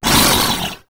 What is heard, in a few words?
zombie rage; zombies